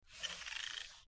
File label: Short Squeaky Mouse